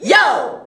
VOX VOX; Sound & Vision - Gigapack II CD 2

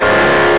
guitar stab

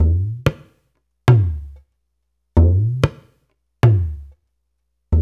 Dara Dance, Darbuka; Spectrasonics; Supreme Beats; World Dance

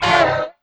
brass, Drum & Bass 160 BPM; Ueberschall; Drum & Bass Flash Trackz

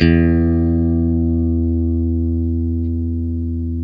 OPEN E6, Guitar; Quantum Leap; 90's Sample CDs

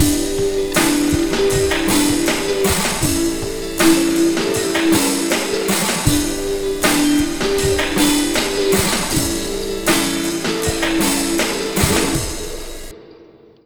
Slo Mo Full, Lounge; Zero-G Kocktail Kollection; Construction Kitz (Lounge)

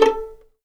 A4 PIZ MF, string; East West; The Ultimate String Collection

